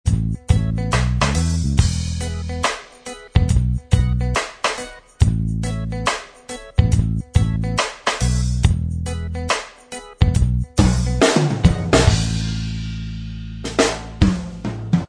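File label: blues reggae style instrumental track, backing tracks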